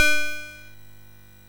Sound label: BASIC, MOS6581R3